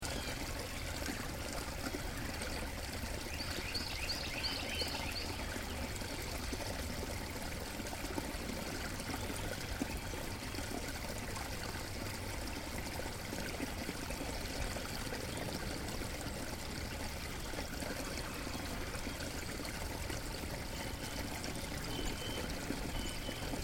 Quaint Brook With Birds; Peaceful, tranquil, refreshing brook or small stream with little birds singing; Bird Sound Effects

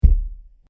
Bang On The Door, Door Knocking; Doors; Crafted Signals

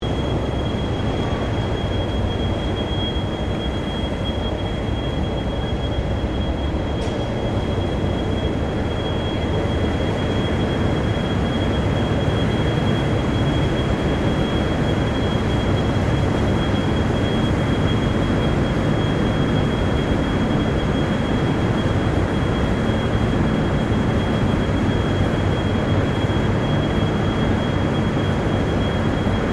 Ambience Railway Station Freight Diesel Distant; General Ambience at Southern Cross railway station; Train Station Sound Effects; Foundation1

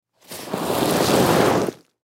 Object Drag On Cement Scrape; Dragging; Objects; Blastwave; Foley

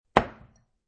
Glass placed on marble counter; Clinks; Objects

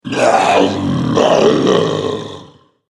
Alien Ogre; Alien Sound Effects

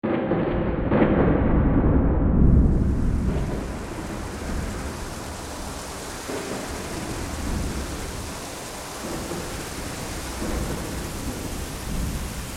Thunderstorm, Thunder and rain; Weather

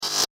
Abrupt Laser Swipe

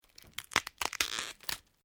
LIVE PLIABLE TWIST SNAP, Organic Foley sounds; Injury Sound Effects; Injuries (Sound Effects:Combat)

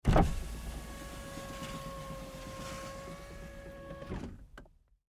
GMC Savana, Power Window, Down, Truck; Vehicles; 2010 GMC Savana; Trucks

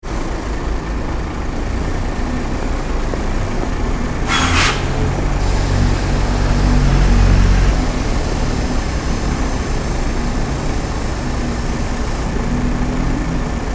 transportation bus idle close city centre; Bus Idling In City Centre ( Some Very Light Pedestrian Noise ); Busses; Vehicles

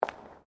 FOOTSTEPS WOMENS LOW HEELS PAVERS STEP; Footstep Foley; Human

